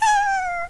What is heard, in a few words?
mumble; World Of Goo